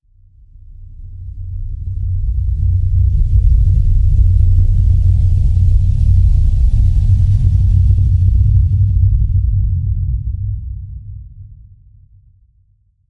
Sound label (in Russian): zvuki s effektom peremeshcheniia videokamery